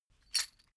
metal chain movement single

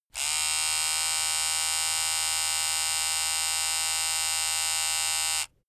razor long

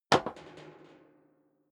step sneaker metal rattle stair down; schritte

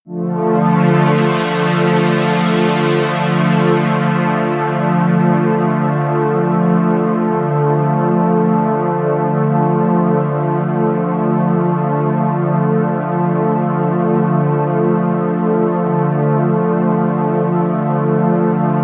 spiritual, music elements

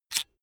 electric drill template slide in fast; maschinen; bohrer; soundpool

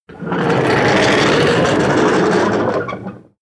slide wooden door short

